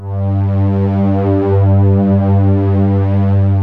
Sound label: MIDI STRINGS